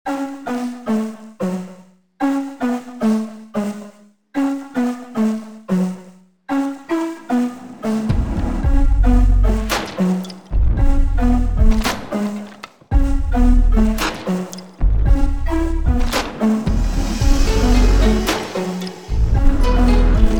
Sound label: music